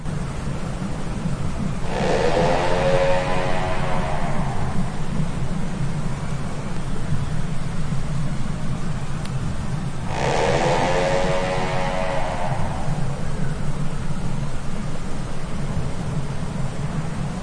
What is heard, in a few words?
crabeater seal